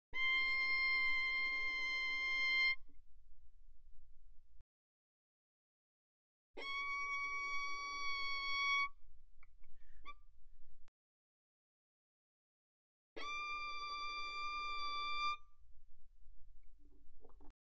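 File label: Violin arco mezzo forte sul D C6 D6; Strings; violin2012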